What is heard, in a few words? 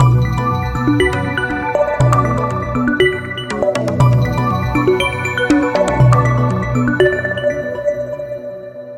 ringer ringtone; bollywood ringtones